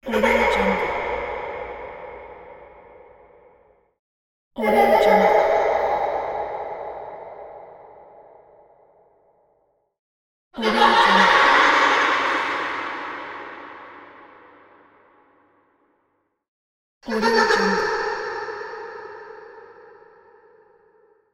laugh

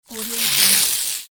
electricity discharge fast; fly-productions

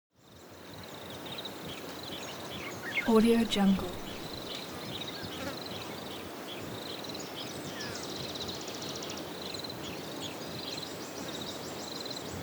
bush african bush birds stream; atmo; smartsoundfx

